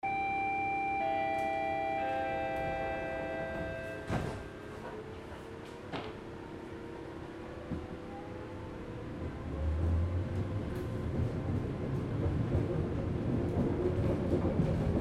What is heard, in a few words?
Subway Ride